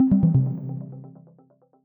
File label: pause back click; 『Chip 1.1』